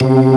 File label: ORG VK, B-3 ORGANS Vinta Keys B3